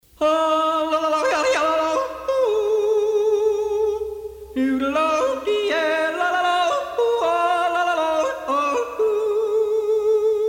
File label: swiss yodel